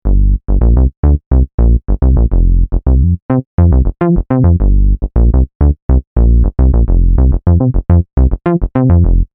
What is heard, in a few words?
Syn Bass; fantom-x8; patch demo